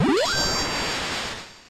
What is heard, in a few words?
Mystical Fire